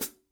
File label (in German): Paiste 13 PST 3 Hi Hat Becken